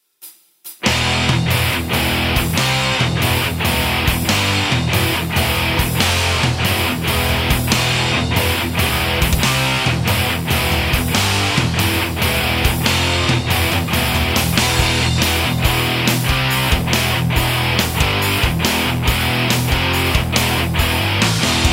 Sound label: metal